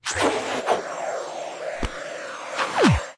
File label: Time Spiral Suck Out; Cut the Rope: Time Travel